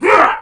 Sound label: Attack